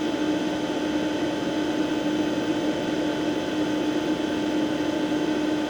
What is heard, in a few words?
ambience